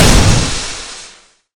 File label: Probe Release; Terraria